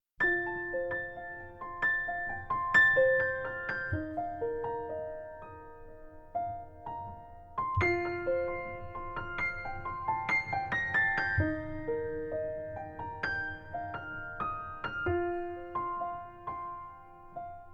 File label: Rainfall, piano solo; Lake Erie